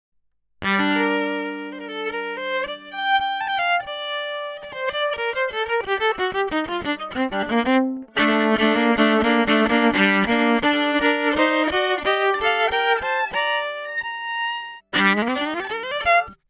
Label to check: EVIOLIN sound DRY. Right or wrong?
Right